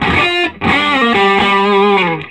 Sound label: SOLO G, Vintage Blues Guitar; AKAI; USB Soundscan vol.22